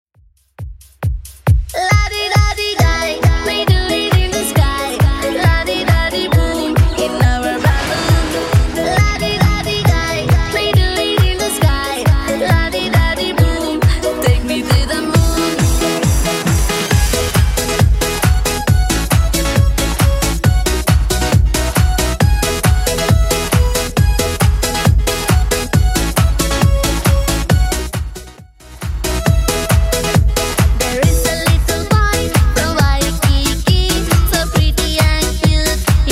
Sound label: 90s Redrum 136bpm